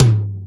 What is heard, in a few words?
Yamaha CS6, Drums